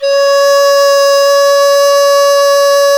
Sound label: qu di C#5, flute; Spectrasonics; Heart of Asia